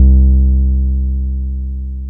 TB303 SQR, ROLAND TB-303 BASS; Rubber Chicken Software Sample Bank

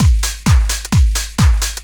drum 130 groove; Techno Trance Essentials

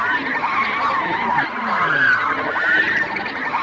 calls, sci-fi atmos; Zero-G Ambient 1

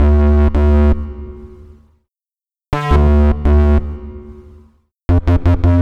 165bpm Dm, Bassloops; Drum Bass; Breakthru; Zero-G; Total Drum Bass

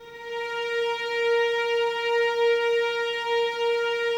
VNS A#4, Violins, String Ensembles; Miroslav Vitous

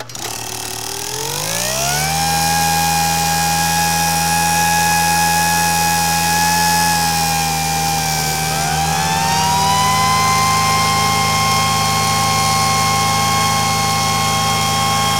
paint dry, Motor Misc; SFX

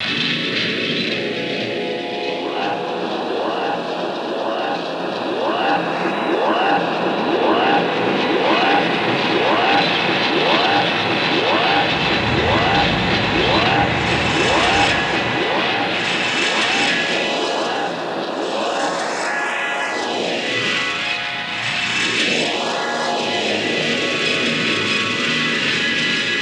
Radiowave G#3; Zero-G - Ghost In The Machine

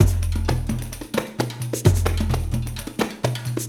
Tar, World Groove; Ambient Grooves

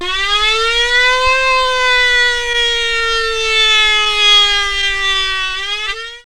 SIREN; Zero-G; Funky Elements

